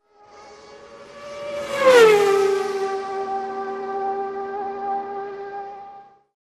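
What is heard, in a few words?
Formula 1 single car passing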